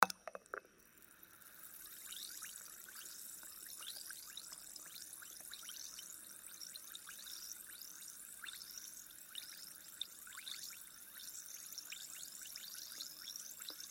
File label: Effervescent Tablet